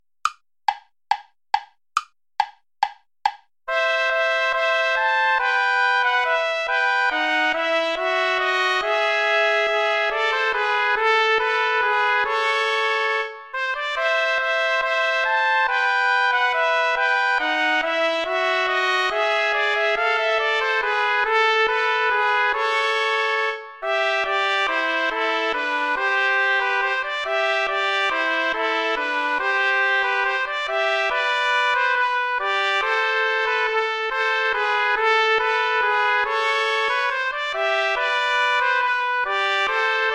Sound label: allemande play along without trombone